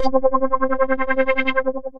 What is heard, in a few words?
Mighty Detuned, Analog, Synth Pad; Ableton; Factory Packs; Synth Essentials